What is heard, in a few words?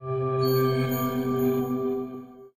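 Samsung Galaxy S22 ringtone notification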